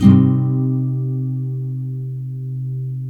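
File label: NYLON, C MINOR UP, CHORDS; Spectrasonics; Hans Zimmer Guitars VOL-1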